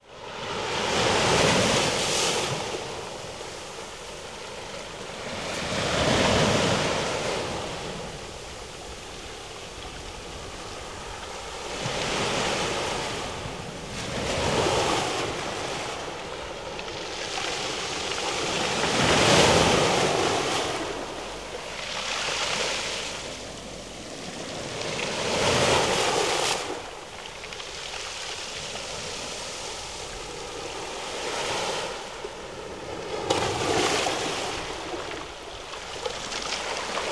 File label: San Remo Spiaggia Waves from Special Rock Structure Pebbles